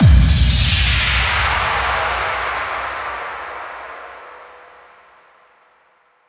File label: FX Kicks; Vengeance - Essential Clubsounds Vol.4; Effects